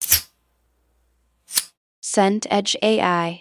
lighter flick

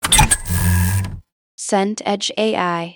door car open

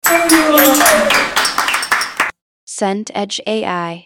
crowd applause scattered

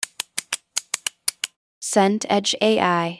mouse double click

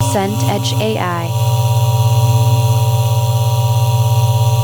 scifi teleporter idle

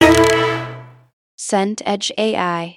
horror sting shock

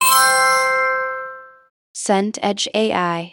success bright

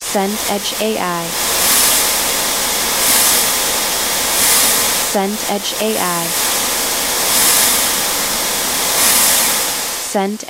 waterfall

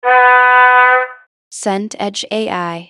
car horn

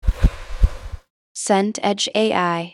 whoosh airy soft

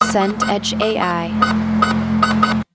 truck reverse beep

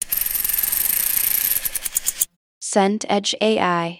bicycle freewheel

